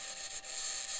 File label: mic, mars2020 edlcam